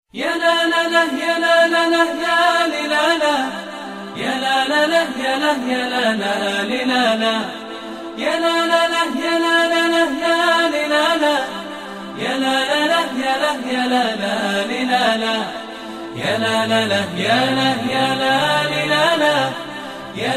Eid nasheed

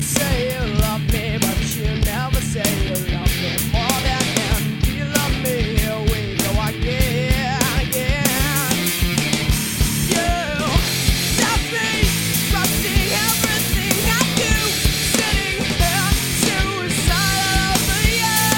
Break

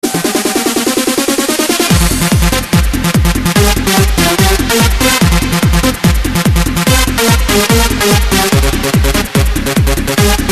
Groove

